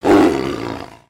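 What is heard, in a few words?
Sabertooth Beg; creatures; pets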